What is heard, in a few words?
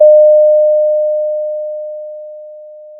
pop, Sound of a school bell